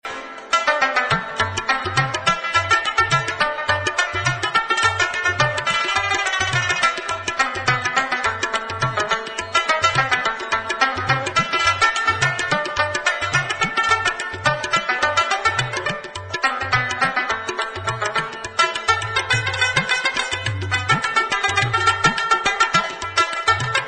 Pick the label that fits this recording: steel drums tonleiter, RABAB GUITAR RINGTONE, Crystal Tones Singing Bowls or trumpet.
RABAB GUITAR RINGTONE